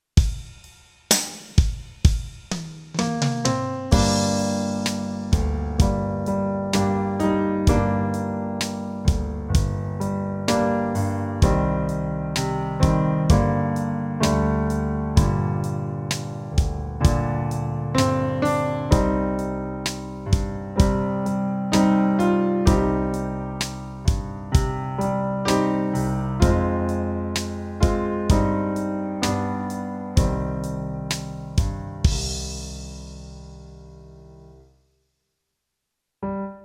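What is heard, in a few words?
backing tracks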